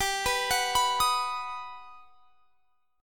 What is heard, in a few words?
G Augmented Major 7th; arpeggiated